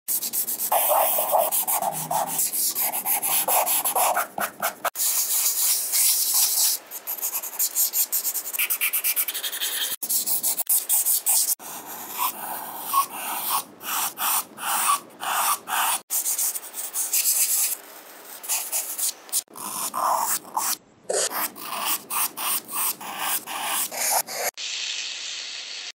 asmr eye drawing using charcoal

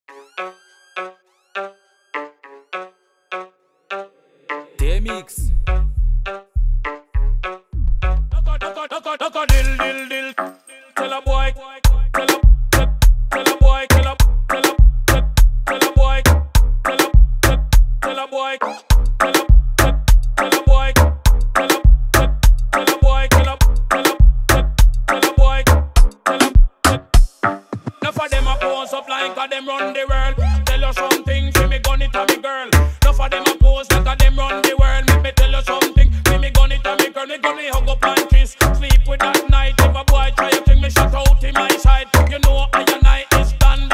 ragga

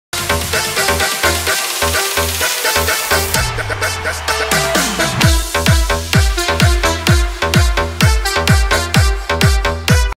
club mix

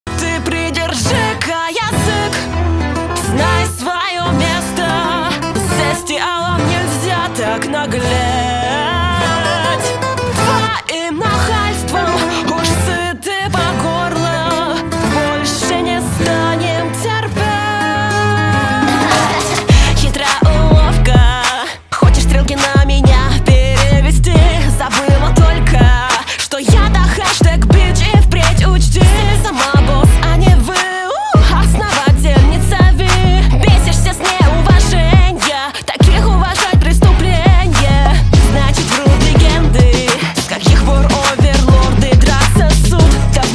russian cover

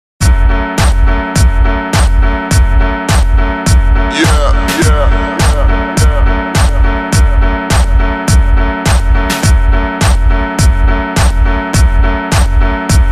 slowed down reverb

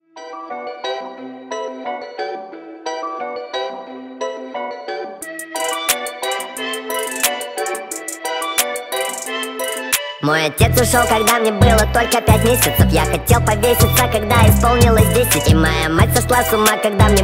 sped up

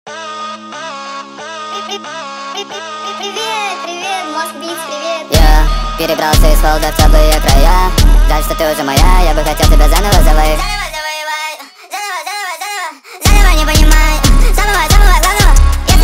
jerk type beat

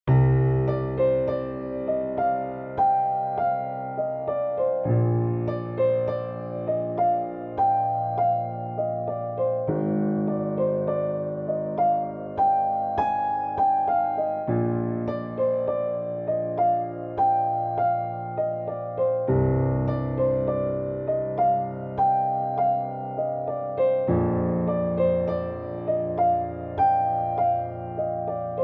piano tutorial; kara sevda

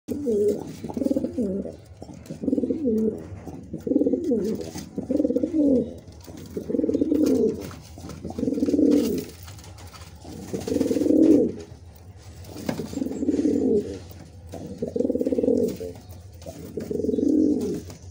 pigeon sound; kaldom pigeon sound

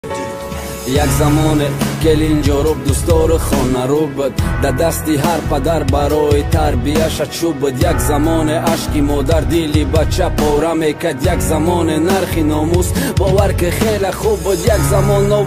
rap

